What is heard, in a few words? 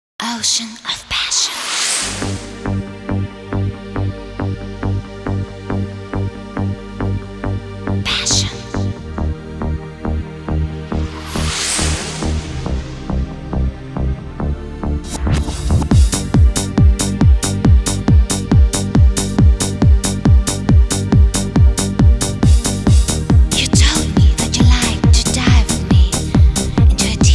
Hypnotic Beat Ambient Mix